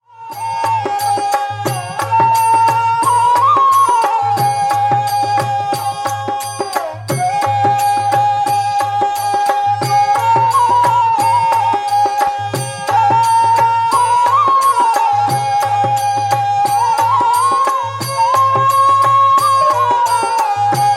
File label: Devotional Theme Instrumental